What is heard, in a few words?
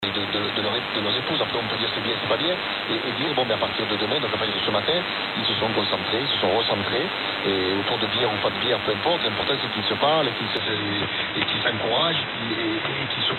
RMC Info 216khz